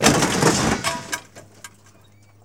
TRUCK JUN, Transportation, Truck Junk

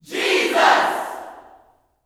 "JESUS", Gospel, YELL SHOUT; ILIO; Vocal Planet

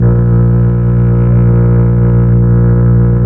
STR, Melo. Cello, Slow Cello; Roland LCDP09 Keys of the 60s and 70s 1

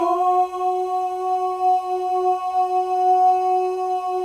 male ohs; Spectrasonics; Symphony of Voices

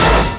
HIT

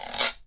GUIRO

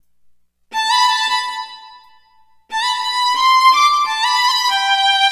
String; Sonic Foundry (Sony Creative Software); Infinite Soul VOL-2

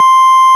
ORG Farfisa Clarinet C 4

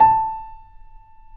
Mp A 4 off, Grand Piano off mic; AKAI S6000 CD-ROM - Volume 2

